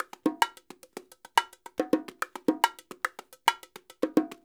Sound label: percussion loops 108; Sampleheads; NYC Percussionworks; 90 s Sample CDs